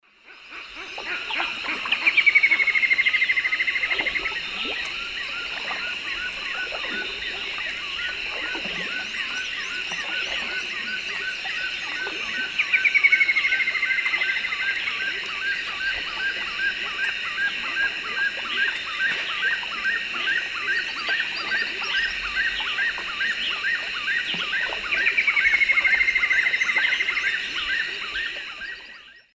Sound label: Dendroplex picus, song; S. America; Eastern Lowlands